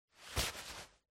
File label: foley jacket